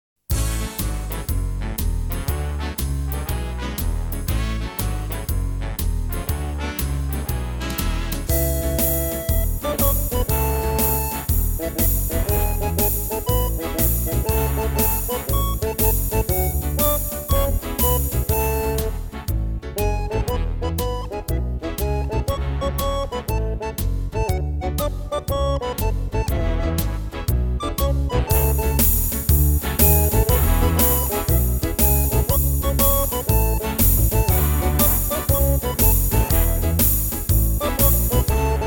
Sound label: karaoke